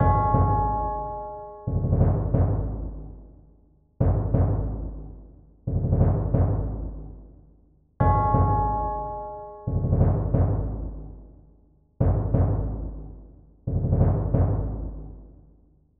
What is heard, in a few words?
serious drum only low